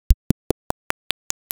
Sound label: reverb test loop